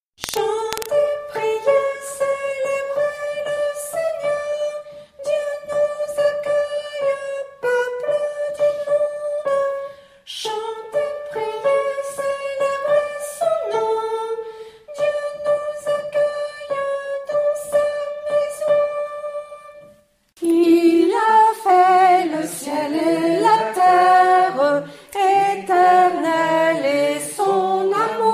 T, Tenor

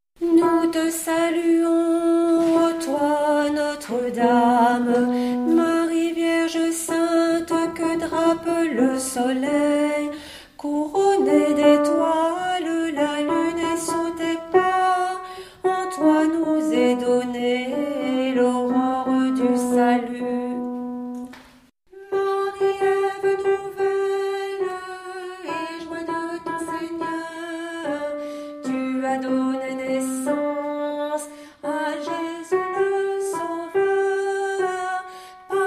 alto

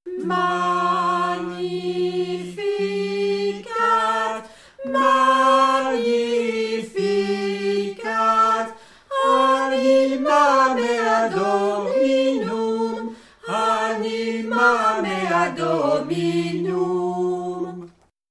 Magnificat Tenor Basse

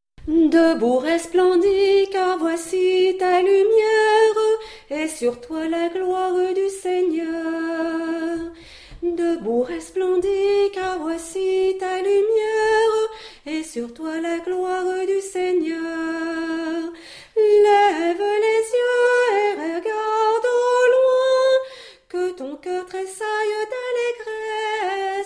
Mariage Debout, tenor

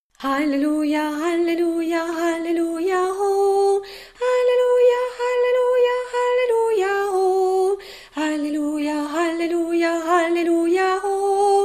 Alleluia malgache, Alto

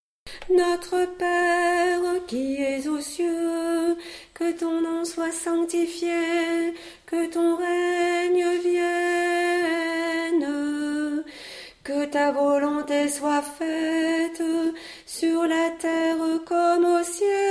Notre pere A, Alto; Notre père de Rimsky Korsakof

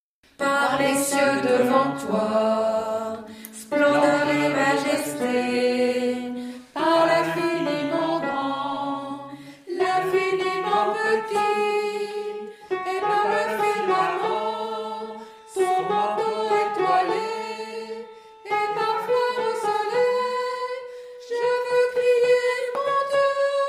Psaume de la creation - Soprano

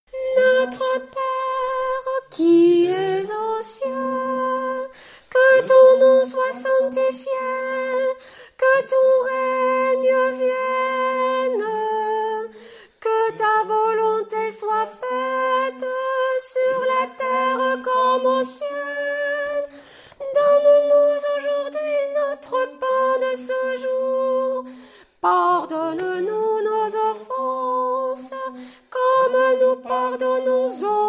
Notre pere Rimsky-Korsakov, tenor; Notre père de Rimsky Korsakof

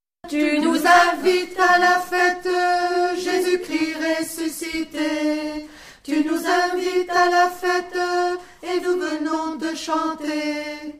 Tu nous invites a la fete Alto

